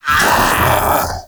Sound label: Infested Splitter Joined Vocal Alerted; Grey Strain